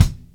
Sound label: kick